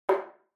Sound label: Megaphone Noise Room Click